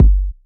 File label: rich lows kick drum G key